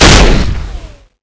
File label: buff fiveseven, weapons